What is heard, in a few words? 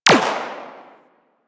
Rifle Fire; Warframe; Venus; Venus Flying Nullifier